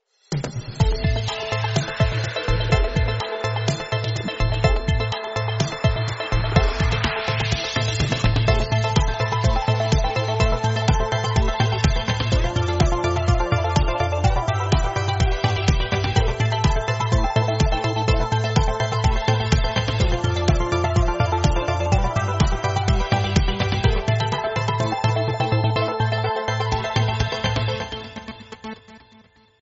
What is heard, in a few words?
Pop